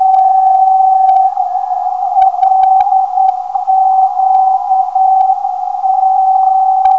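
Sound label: 446kHz, non-directional beacon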